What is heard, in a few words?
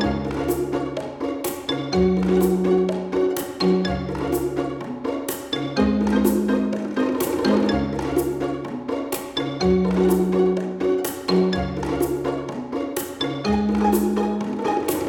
Ocean Village; Aztec Tiki Talisman; PS4; Xbox Series X|S